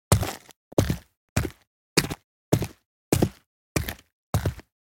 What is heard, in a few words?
Footsteps on street shoes walk